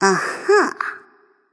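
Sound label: nonverbal agree; smite; ratatoskr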